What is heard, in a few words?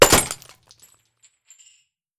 glass Shat CRSNK